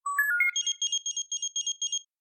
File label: cell ringtone; FFXV